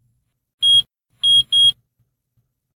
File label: PowerOff